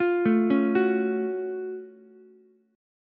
F sharp Minor Major 7th F sharp down Ukulele; arpeggiated

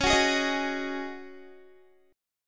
Db Suspended 2nd Suspended 4th Db Guitar; strummed; 21,21,18,20,18,x

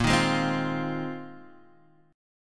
Bb 7th Suspended 2nd Suspended 4th Bb down; Bb7sus2sus4; strummed; JGuitar

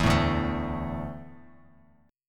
D Suspended 2nd D down Bass Standard; strummed

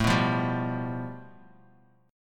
A 7th Suspended 2nd Sharp 5th, Bass Standard; A7sus2#5 strummed; JGuitar

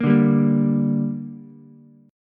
D Suspended 4th Sharp 5th D down Ukulele; strummed

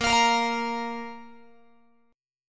A sharp 5th A sharp Guitar; strummed; JGuitar

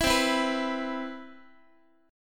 C Major 7th, Guitar; CM7 strummed

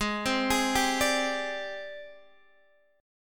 G sharp Major Sharp 11th, Guitar DADGAD; G#M#11 Chord

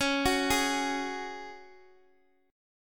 Db Suspended 4th Db

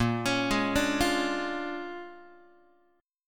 Bb 7th Sharp 9th Bb, Guitar; Bb7#9; arpeggiated; JGuitar

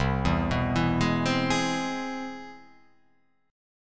C Augmented Major 7th

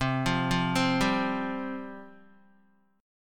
C Suspended 4th C, Guitar; arpeggiated

